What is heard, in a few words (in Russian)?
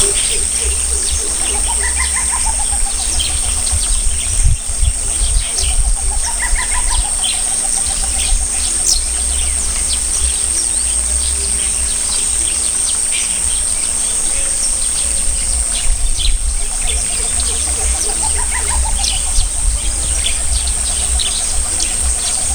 lesnoy sych athene blewitti; nochnyh ptits